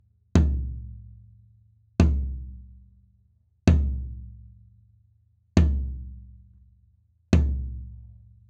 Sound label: tom III floor; md 421 kompakt; mikrofonu test